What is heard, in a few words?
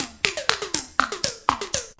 126 bpm silly electro drums